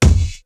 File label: KICK, Hip Hop